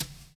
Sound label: VINYL HH, HH CLSD, Drums Dance & Groove; USB Soundscan vol.11; AKAI